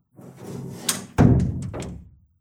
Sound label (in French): Fermeture porte